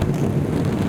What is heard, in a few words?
missile Trail; Mindustry